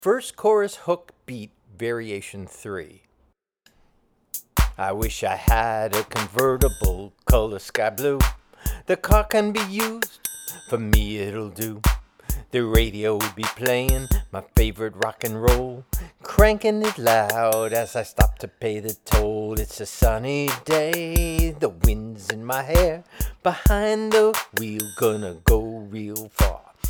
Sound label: Verse Chorus Hook Beat Variation